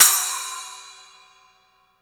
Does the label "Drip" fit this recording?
No